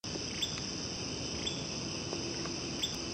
Asian Fairy Bluebird male call; Amar

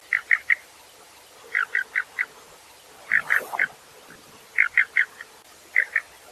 Collared Bush Robin calls, Taiwan; Dasyueshan National Forest Recreation Area Heping District

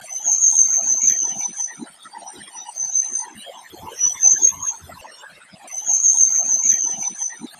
Chestnut naped Forktail calls; Amar

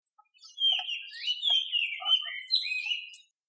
mimic Magpie Robin Call

